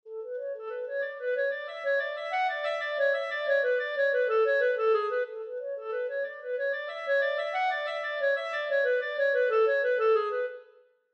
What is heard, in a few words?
Clarinet; examples in treble clef